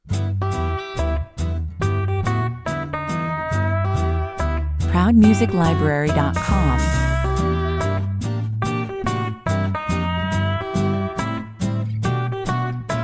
Western swing featuring steel guitars and electric guitars, 141 bpm